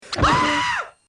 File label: homer scream; the simpsons